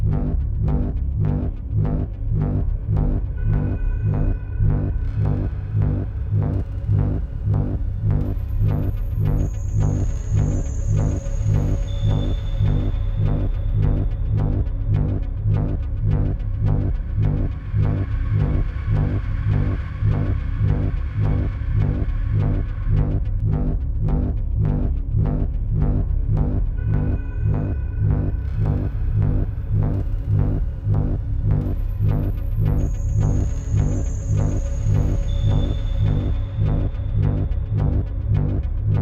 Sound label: Music Drone Mix Medium Tempo; Battlefield 3